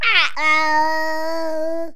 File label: baby uh-oh